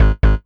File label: error